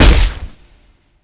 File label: hit